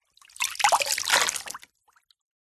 household cleaning cloth wet in and out gently of bucket of water